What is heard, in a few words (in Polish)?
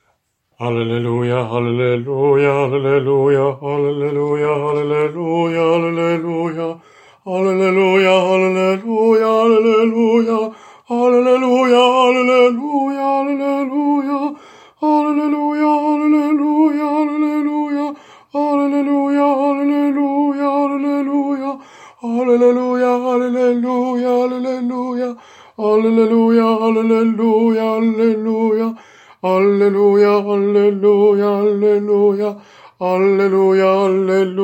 SOPRAN; Alleluja Young